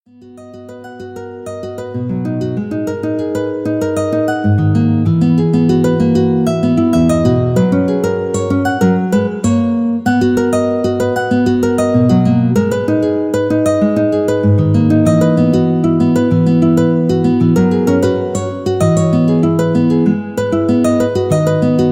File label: Lenovo Z5 Orignal Ringtone